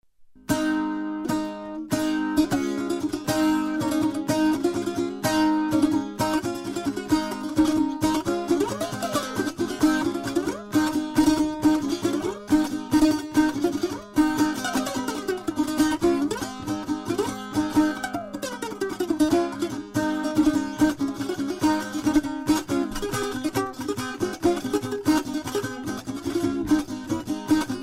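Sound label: virtuoso playing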